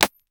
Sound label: two arrow hisses one star, bow; sound effect